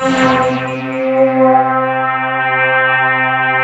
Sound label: KWENK, PEAVEY DPM; Rubber Chicken Software Sample Bank